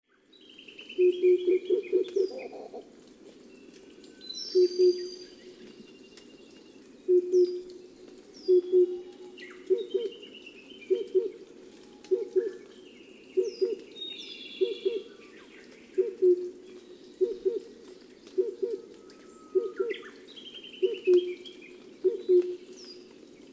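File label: oriental cuckoo, hokkaido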